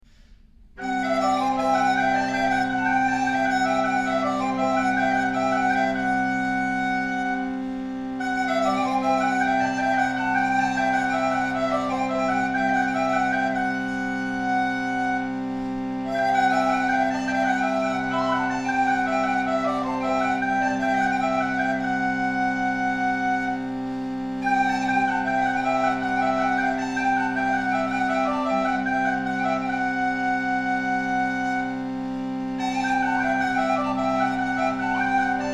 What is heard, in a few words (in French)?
chalumeau Improvisation I